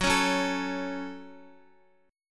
Gb Minor Guitar 4E,4A,5D,5G,5C,6F; strummed; JGuitar

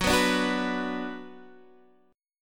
Gb Suspended 4th Sharp 5th Gb

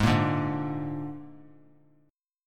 Ab Suspended 2nd Flat 5th Ab, chord; strummed; JGuitar

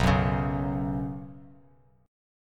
B Suspended 2nd, Bass Standard; Bsus2 strummed; x,2,4,6; JGuitar

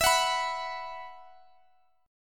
E Suspended 2nd, Guitar Open G

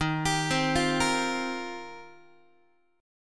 D sharp 6th Add 9th, Guitar; arpeggiated; D#6add9; x,11,10,10,9,9; JGuitar

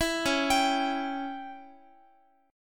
Db Diminished Db down Guitar; arpeggiated; JGuitar

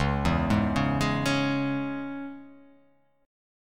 Db Minor; arpeggiated; JGuitar

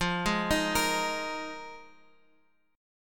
F Minor 11th, Guitar Open F; arpeggiated

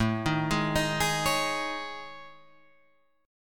A Major 7th Flat 5th

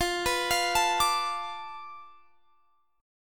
B Minor 7th Flat 5th F, guitar chord

